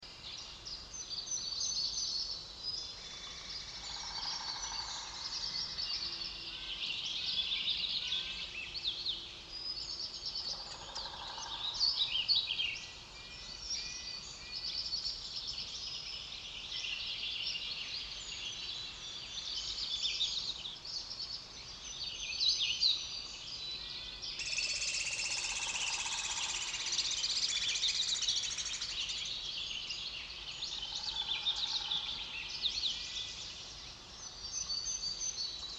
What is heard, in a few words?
conif forests